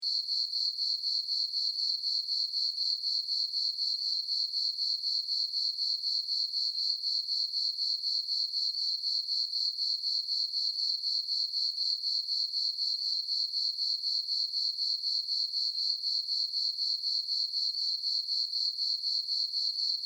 Crickets Chirp, Crickets, Chirp, Ambience, Night, Insect, Bug; Animals:Insects; Blastwave